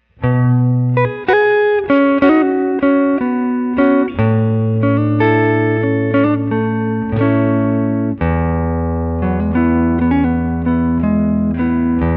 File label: neck pickup